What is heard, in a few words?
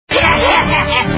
haha